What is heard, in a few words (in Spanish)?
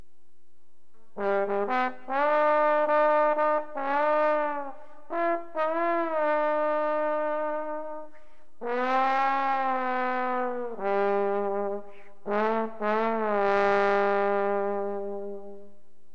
trombon, instrumentos